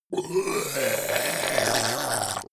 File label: zombie grunt choke male long; kreaturen